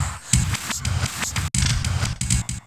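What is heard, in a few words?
rhythm loops, 90 bpm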